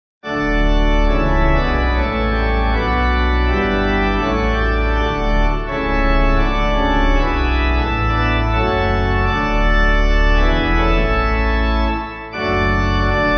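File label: Pipe, Pipe Organ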